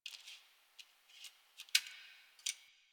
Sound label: Computer Room Floppy Disc; Warframe; Nineteen Ninety Nine